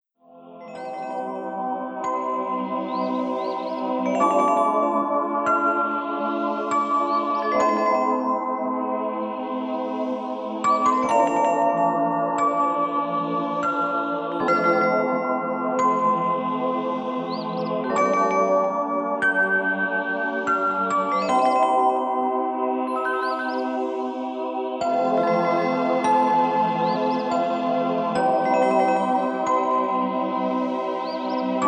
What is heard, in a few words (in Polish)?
muzyczny miks relaksacyjny 432 Hz; sjesta w raju